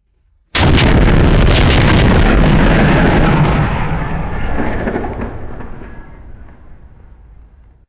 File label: armored vehicle heavy gun firing; guns